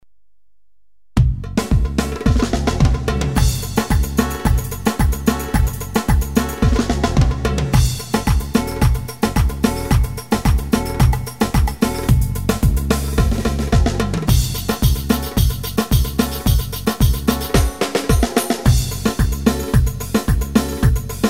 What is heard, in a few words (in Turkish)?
2-4, sx3000 ritim; kendi yazdığım bazı ritimlerin demoları